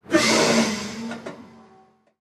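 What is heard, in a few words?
school bus brakes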